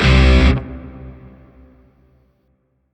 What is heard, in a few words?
Shawzin Blues Chord I; Warframe; Grineer Shawzin